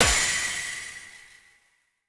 sphere shatter; boss; gynthar

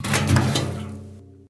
shake; Chapter5End

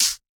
hit medium; CrossCode; battle

